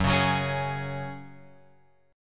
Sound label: Gb Suspended 2nd, Guitar, Open F; strummed; x,1,1,1,4,3